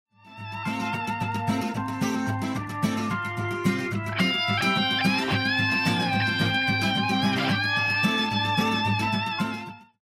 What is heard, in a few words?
round ten sec count; music kits custom